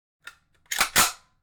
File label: rack; FAL; Zomboid; VFE